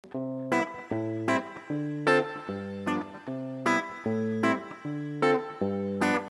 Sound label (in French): C majeur, Harmonisation; Anatole